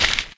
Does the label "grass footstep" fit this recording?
Yes